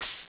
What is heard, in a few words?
door; moon